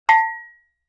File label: Cowbell High; Vintage Cartoon; Drums & Percussion